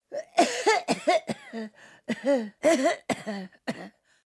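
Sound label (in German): Alte Frau hüstelt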